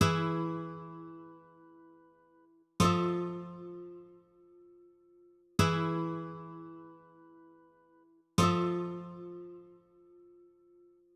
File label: Guitar, Hip Hop Exotica, 086 Emin; Apple Loops; Big Fish Audio